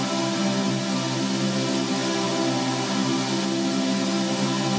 Pad Loop C, shimmer and sparkle; Pad Loops

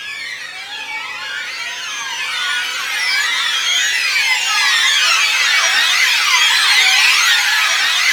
STR FX, HORROR; East West - Global Sounds

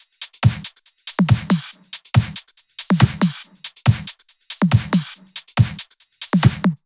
Loops 140 BPM Hihat Percussion Loops

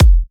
Kicks E; markus hakala

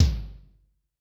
AC Tom F, Yamaha DTXpress-II

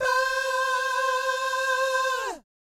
VIB FX, Jazz FX

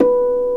BOTTLES; OMI - Universe of Sounds

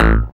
SYN SYX, Dance Synth; Sound & Vision - Gigapack I CD 2

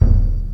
TOM, Industrial; USB Soundscan vol.24; AKAI

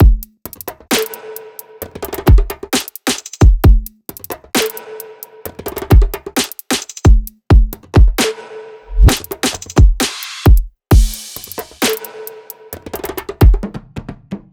loop full 132; Seismic Loops

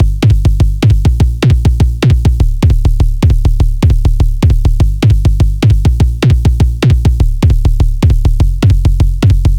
Phase Plant, bass

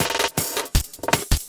LOOP SD, 160 BPM; Ueberschall; Drum & Bass Flash Trackz

